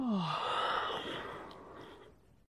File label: sigh; Cosmic Rage